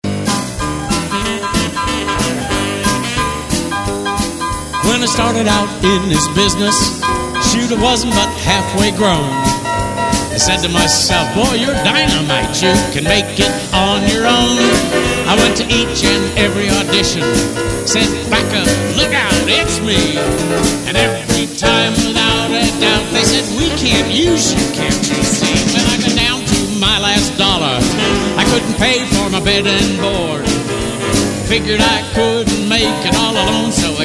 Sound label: string man